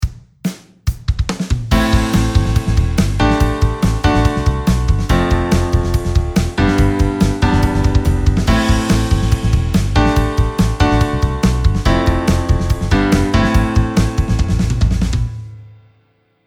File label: delay